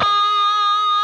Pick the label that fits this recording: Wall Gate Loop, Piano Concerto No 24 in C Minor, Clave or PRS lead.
PRS lead